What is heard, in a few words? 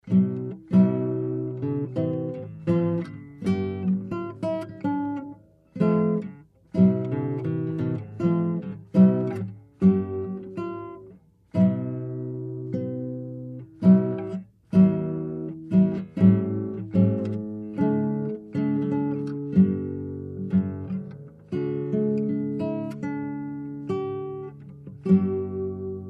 Acoustic Guitar Play; guitars